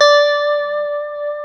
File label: D JC120; Spectrasonics; Hans Zimmer Guitars VOL-1; JC-STRAT MF